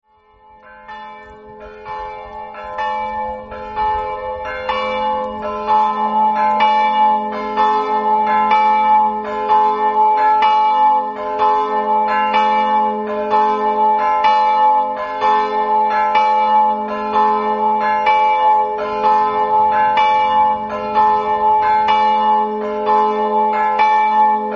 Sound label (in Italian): campane